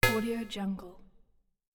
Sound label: scifi interface synthetic button